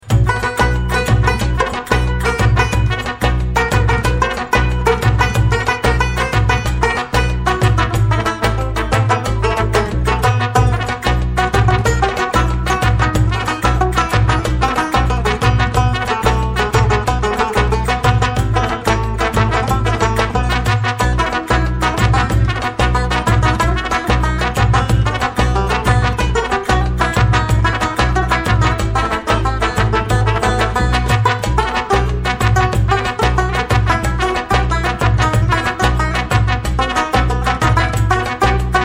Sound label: desert banjo